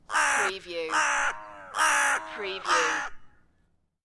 Bird Crow Krah 4 times - Other crow in background

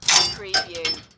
Chain Metal Clanging

